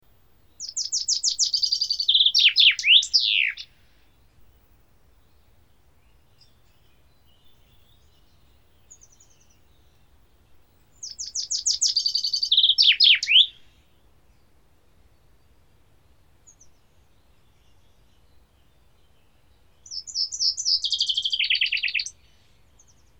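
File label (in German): buchfink, Chaffinch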